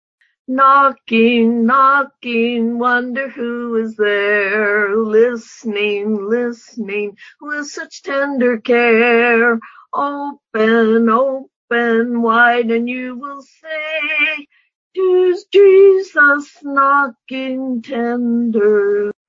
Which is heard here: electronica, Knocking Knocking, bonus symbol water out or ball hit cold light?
Knocking Knocking